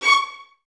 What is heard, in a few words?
Violins C#6, String Ensembles; Miroslav Vitous; LINKS ORCH